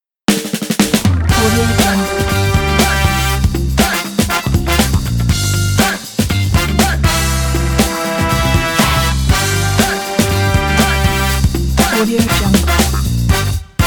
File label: intro funk logo